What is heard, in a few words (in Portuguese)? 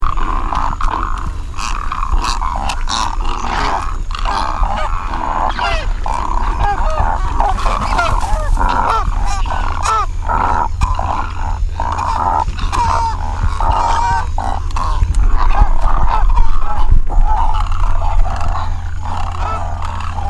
Garça-branca